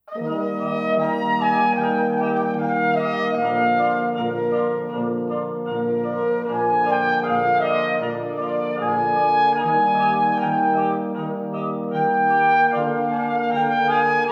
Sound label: BRASS, Largo; WINTER